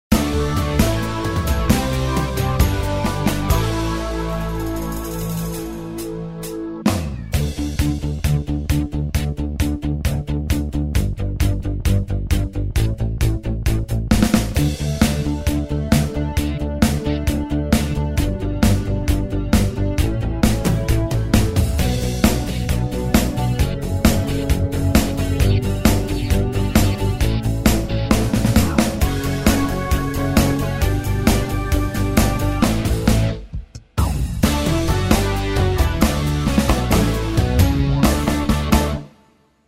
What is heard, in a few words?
Straight Rock